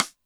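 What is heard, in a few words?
Snare Tite Stick, Alesis D4 drum machine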